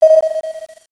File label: lift; vendetta